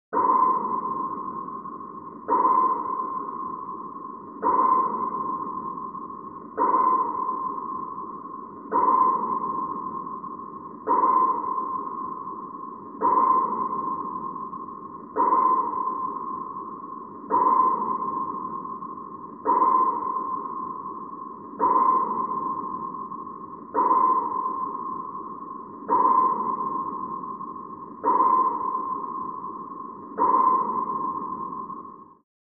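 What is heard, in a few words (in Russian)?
eholokator, echo sounder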